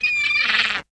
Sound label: die